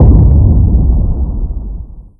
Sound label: far explode